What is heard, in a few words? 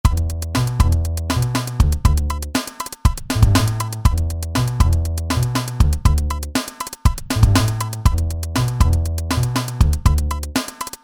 Drum Bass